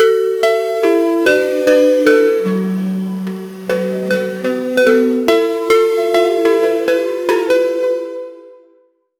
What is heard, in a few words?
make an 80s style jingle, holiday